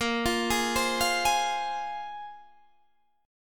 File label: Bb 7th Suspended 2nd Bb, Guitar Open D